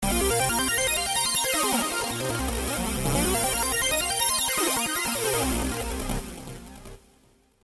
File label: saw; v-synth